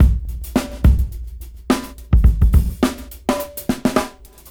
Bumpy 106 BPM; AMG - Keith LeBlanc Phat Butt Jams

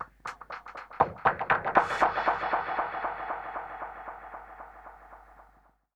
Dub Drums B Echo Kit 120bpm; musicradar

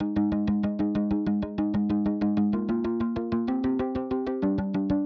driven fake guitar

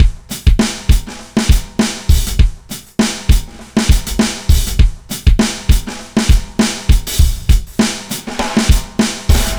bouncy kit 100bpm

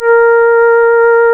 REED A#; Big Fish Audio; Synclav FM

